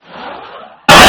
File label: Swoosh, phone notifications; Goophone Y5; iPhone 4S clone; Chinese clones